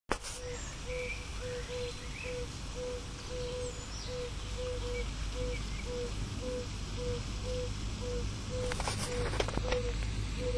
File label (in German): Gelbbauchunke, Rufe